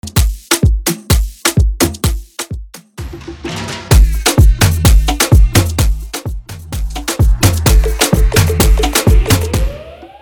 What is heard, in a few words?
afro bootleg 128Bpm